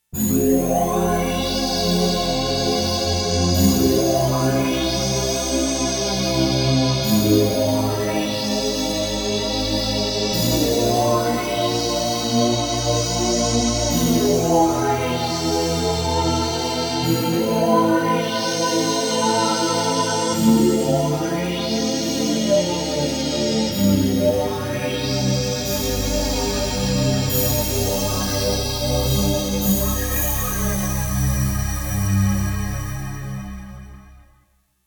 NEPTUNE, Roland JX-1; Factory presets

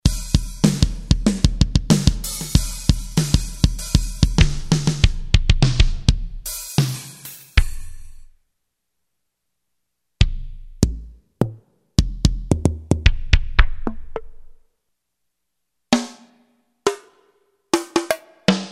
Voices, Yamaha S5502 Drums

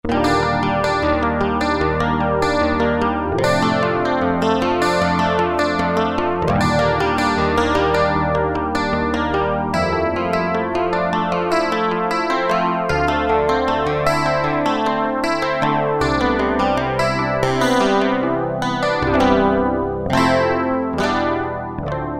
ChorusGuit, Roland MKS-50; Factory examples

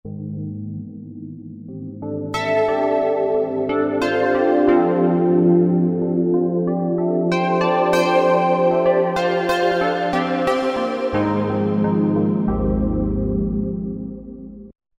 China E-Pno, Roland V-Synth; Factory Patches

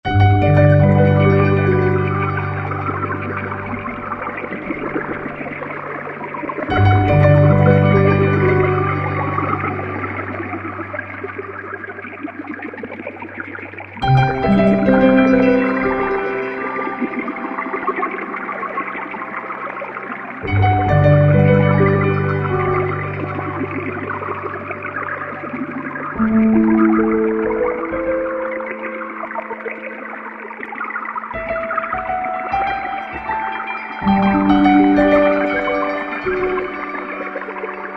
Aquatic Piano, Roland V-Synth; Factory Patches

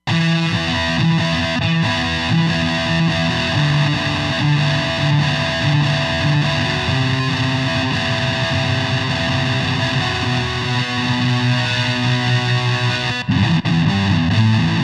Punker, Roland Fantom XR

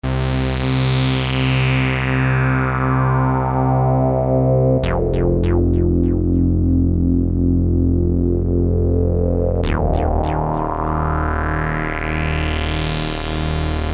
Stereo TB-303, SuperWave P8

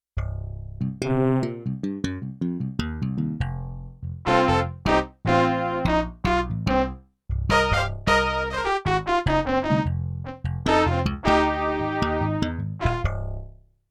Funky Section, Yamaha TX16W; Europop Performance 1

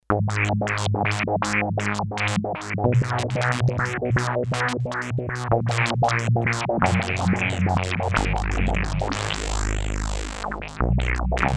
Bass

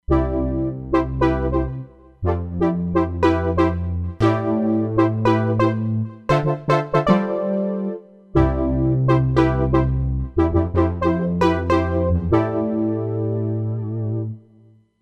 Silky JP, Roland Fantom XR

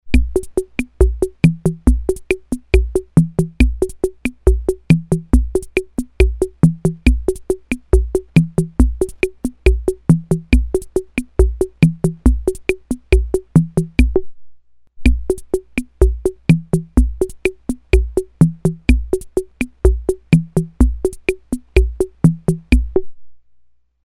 RUMBA, Ace Tone Rhythm Ace FR-2L

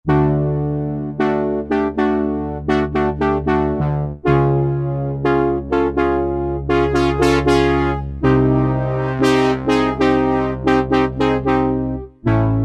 MKS-7 Brass, vintage synth; Roland SR-JV80-04

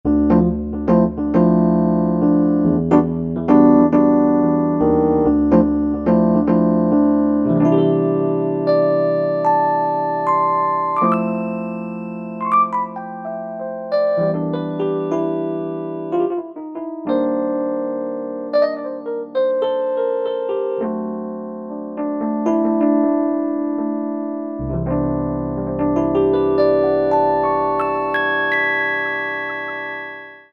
Melosoul EP

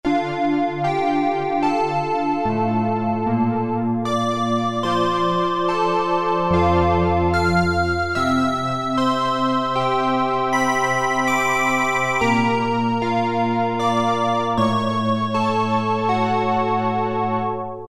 Pluck Bell, Roland Juno-106; Factory Preset

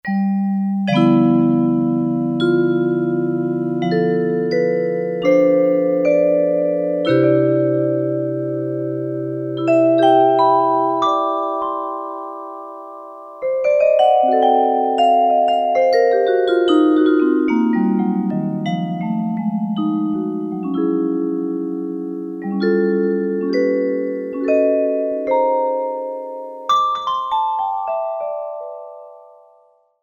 VIBES, Korg DSS-1; Vibes, Glock, Piano, Clav

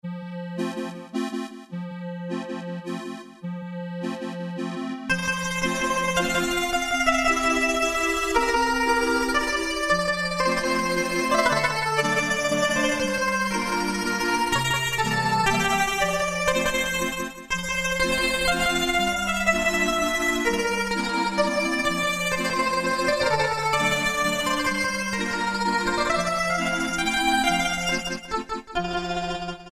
Venetian Cafe, Roland PN-D50-04

